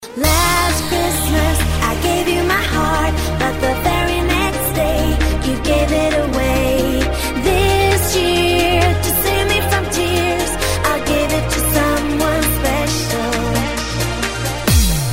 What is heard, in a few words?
disco